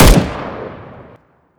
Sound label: m16 shoot